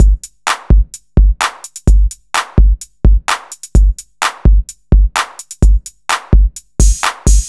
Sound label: 128BPM Resistance Kit